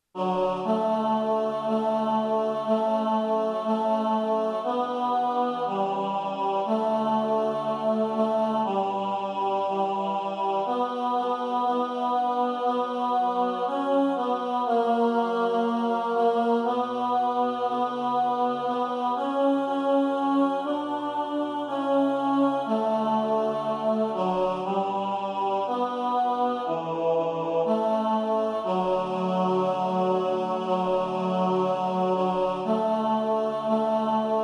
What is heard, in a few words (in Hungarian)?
Atyám a Te kezedbe tenor